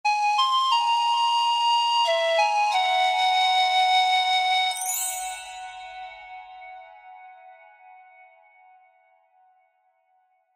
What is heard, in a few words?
Tribal Accent Mysterious; Musical Sound Design; Blastwave